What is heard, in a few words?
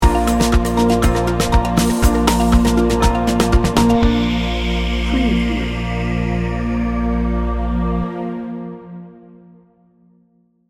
inspiring and hopeful sting bumper